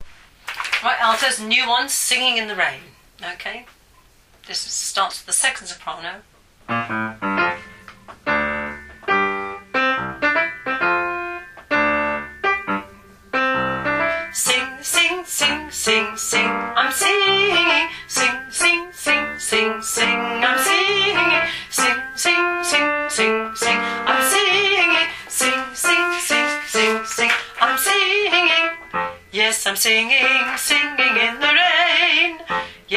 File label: Singing altos